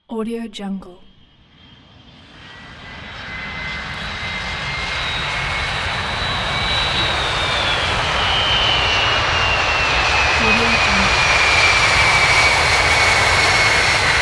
large airplane landing

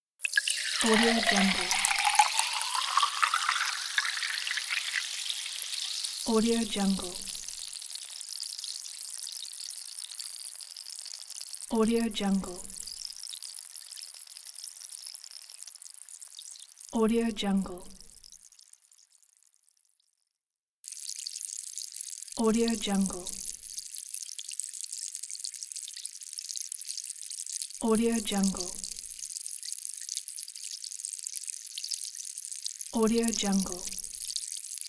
sparkling water; kuksaudio

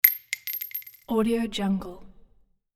shell casings single tubular chunky

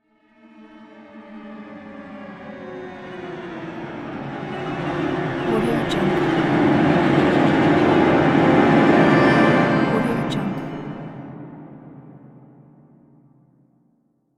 creepy strings

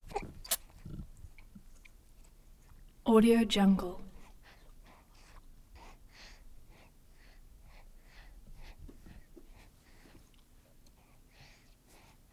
baby breast feeding swallowing sucking; prosoundfx

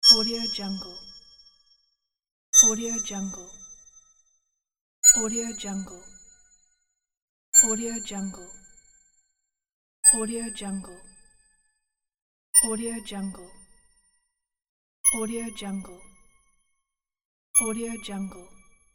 magic bell buttons

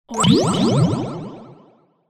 underwater health recovery

